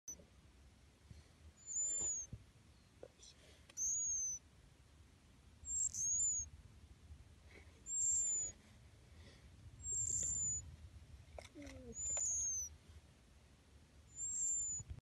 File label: european edible dormouse; اشگول معمولی